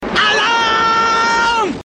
alarm; Das Boot

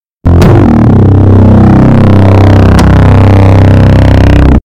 intro earrape; regular show

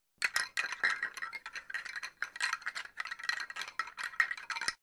skeleton rattling

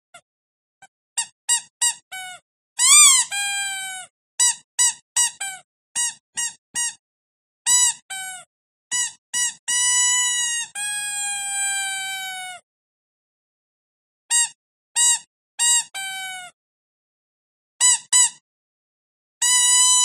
dog ear rape

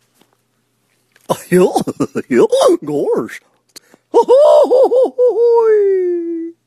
laugh; yoho goofys

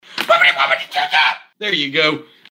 cha cha

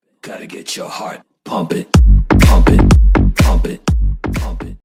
bildirim sesleri notification tone sms tone

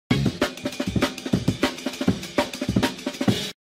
Amen Break

